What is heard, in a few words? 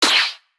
Join Hit; Boomerang Fu